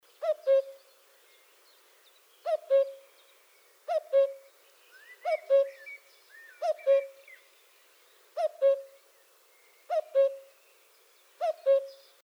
coucou; À vitesse normale

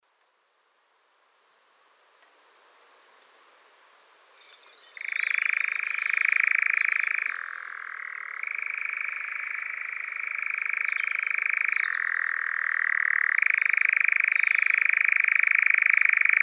ENGOULEVENT ailes; Engoulevent fin de séquence

